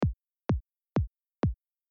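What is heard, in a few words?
140 explosion kick dry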